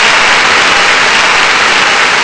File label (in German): jubel; Amiga Soundtracker Sample Packs